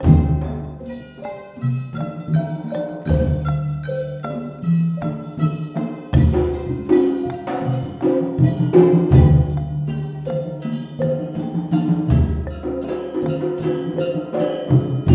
MALAY R, Heart of Asia

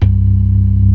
ORGAN, NO PERC

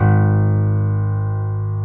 G#0 PNO.FOR, G.PIANO; AKAI S-Series CD-ROM Sound Library VOL-7

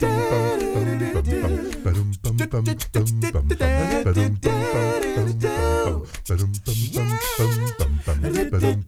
Accapella, Doo Wopper, Blues & Soul

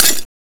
SMASH; FAIRLIGHT CMI IIX; SFX

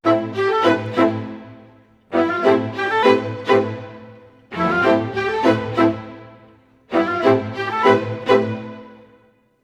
95 a min full mix, Orchestral arrangements

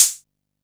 Hat, Drum & Bass

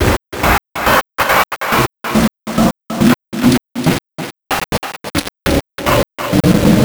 Envelope Beat; Drums & Drones

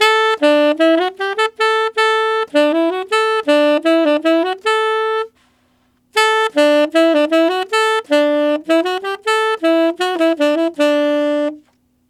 Tenor Polka in D maj, Tenor Sax Loops, Jazz; Sonic Foundry (Sony Creative Software); Jazz Solos and Sections

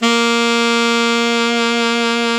sax alto mf; Alto 414

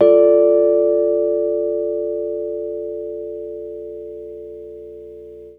Chords Hits; E-MU Producer Series Vol. 8 – Platinum Phatt; EP Chords Hits

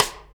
Rimshot; Equipped Music; Loungin House

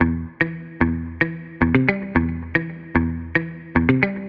112 Em Sounds Like Guitar, Melodic Loops; Electronisounds

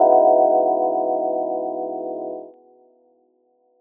Misc Stab

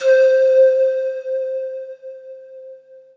SQ1, Synthesizers

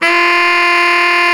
SAX T. GROW, Tenor

